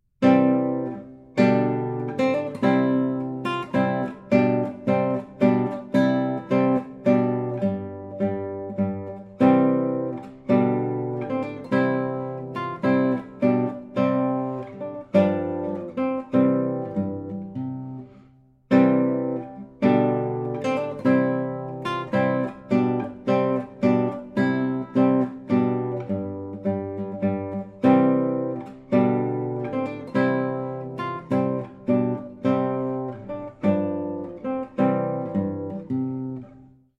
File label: Trumpet Voluntary